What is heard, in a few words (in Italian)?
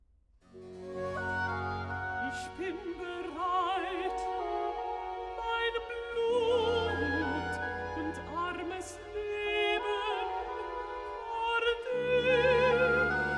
Recitativo Alt; Ich bin bereit; BACH VOCAL; CANTATAS; BWV 183; Sie werden euch in den Bann tun